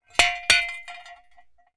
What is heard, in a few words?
Metal; TOOLKIT vol.2